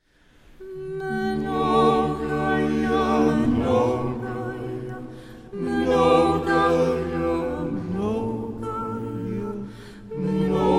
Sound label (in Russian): хор Многолетие